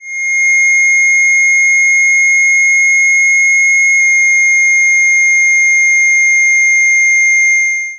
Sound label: clipped polyBLAMP